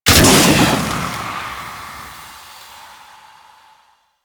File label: Infested Pistol Fire; Warframe